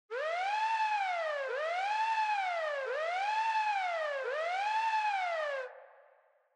Police Car Siren Fake Reverb Slow

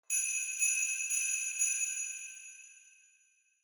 Sleigh Bells Reverb Knock Gentle Short

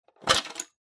Pencil Case Open

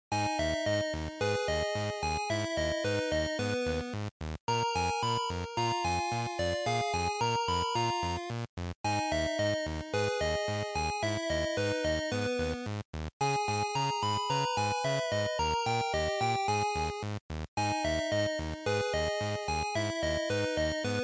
GB JP Game Over

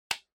Hand Foley Clap Gentle

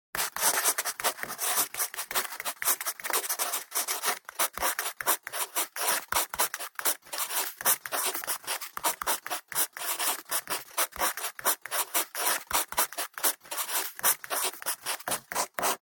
Mechanical Pen Write

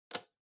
Wall Clock Toggle

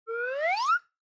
Slide Whistle Mid Up

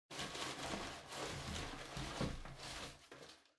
Cardboard Box Far Rummage; ダンボール03-21(遠い ガサガサ); 効果音＞梱包材

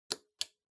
Stopwatch Mechanical Start Table

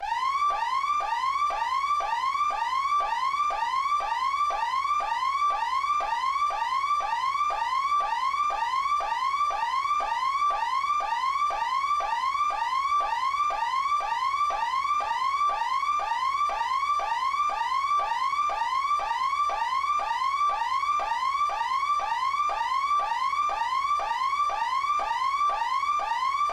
Warning Siren

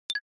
Smartphone UI Sound Dry

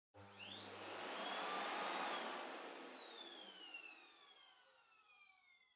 Vacuum Cleaner Far Vacuum High Short

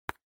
Cell Phone Hardware Touch

